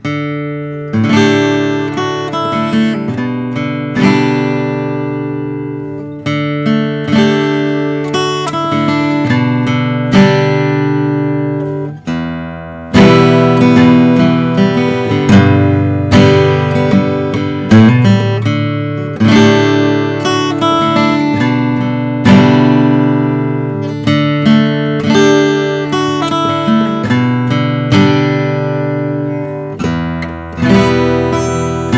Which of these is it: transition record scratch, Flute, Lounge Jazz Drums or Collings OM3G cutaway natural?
Collings OM3G cutaway natural